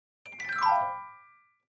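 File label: Clavichord Descending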